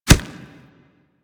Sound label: Step Dirt, Footsteps; Warframe; Kubrow